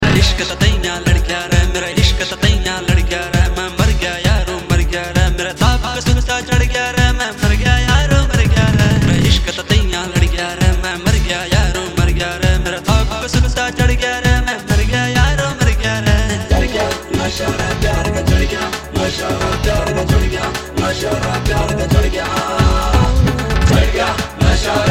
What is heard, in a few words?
Ring Tone